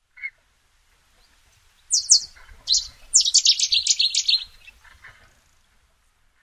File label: cettis warbler; Rainham